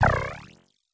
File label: synth boing